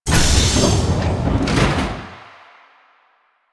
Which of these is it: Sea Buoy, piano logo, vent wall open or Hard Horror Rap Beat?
vent wall open